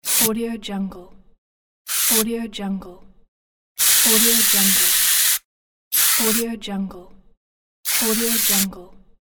perfume spray sounds

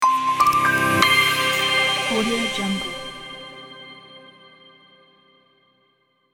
space logo

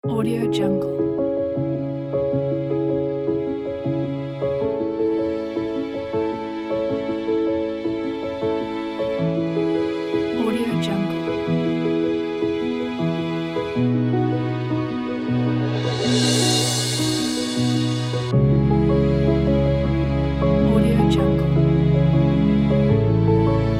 uplifting piano and motivational orchestra

